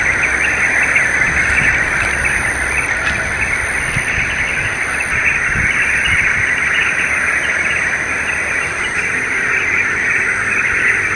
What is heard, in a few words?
Yellow-vented Bulbul Communal calls, Ipoh Perak Malaysia